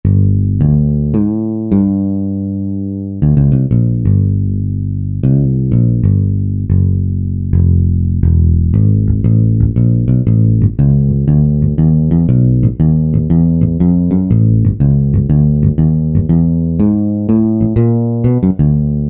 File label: Finger Bass, Korg 05RW